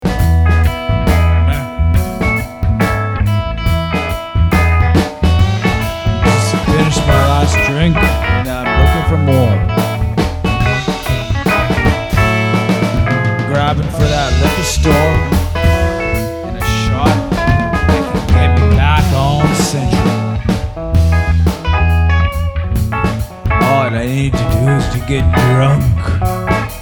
Booze Blues

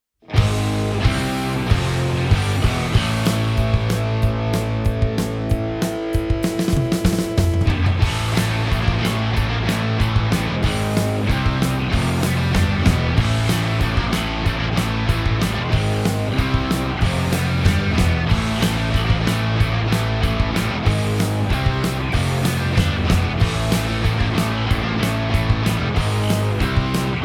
Instrumental Mix

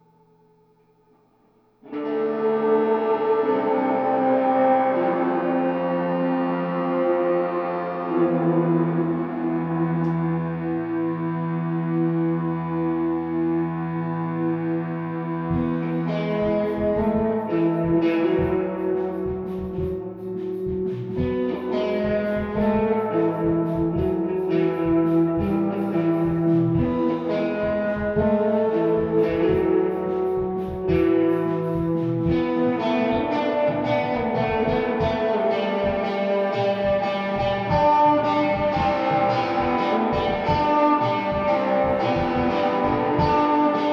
guitar room; RodeNT1A

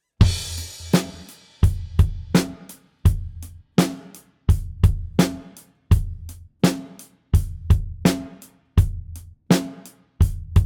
Drums ONLY; Pre Pro; MIXED-MASTERED

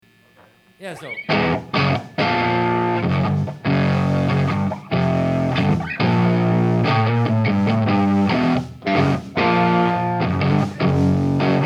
Riff

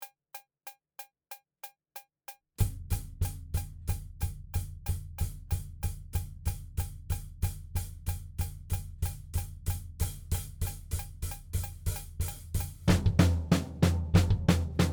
Drums ONLY; Pre Pro

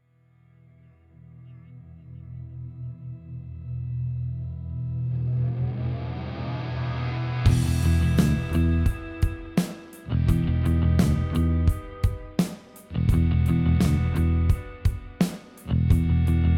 Instrumental Mix

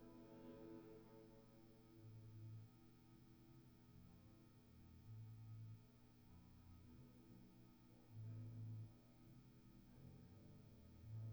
guitar room; RodeNT1A

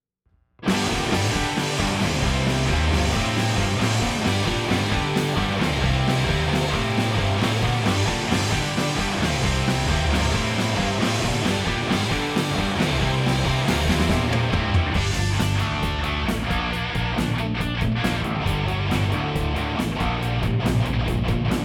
w Guitars

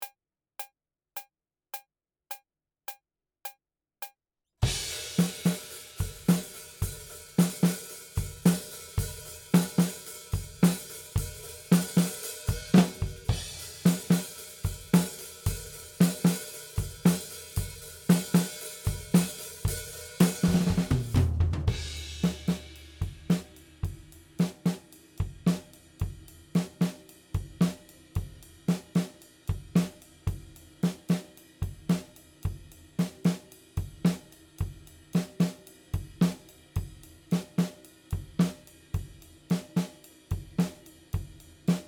Drums ONLY; Pre Pro

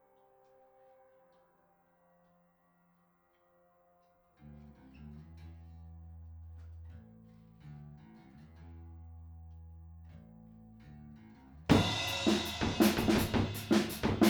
MS Fathead Drums

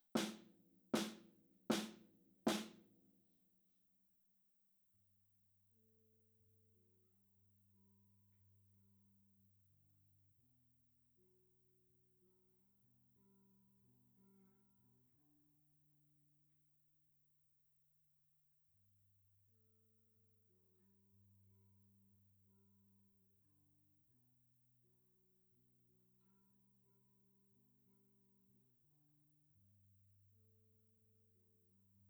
AKG414, drums

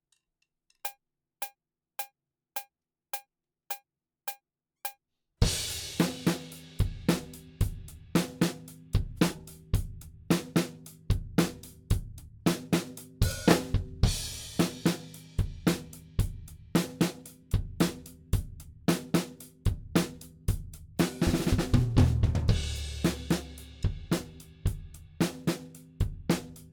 Drums ONLY

